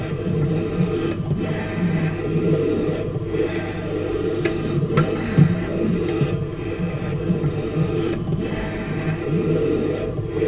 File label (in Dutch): geluiden uit hengelo